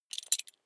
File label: metal belt buckle clatter regular